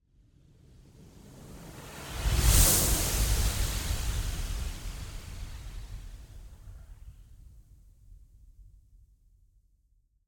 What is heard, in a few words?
Whoosh, Sound Design, Swirl, Airy, Diffuse, Medium Slow; Airborne Sound; Eclectic Whooshes 2